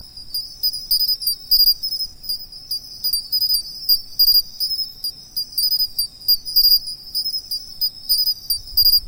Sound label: okinawa matsumushi